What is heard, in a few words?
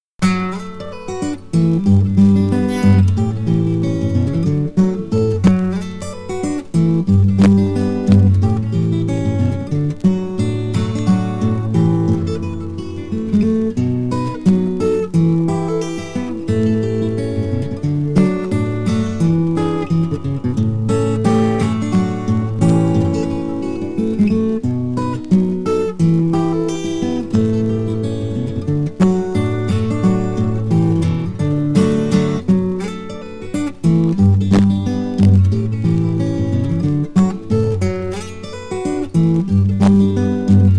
ragtime guitar